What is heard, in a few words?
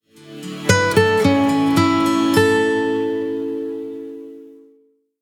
Startup tone, Nokia; Nokia E5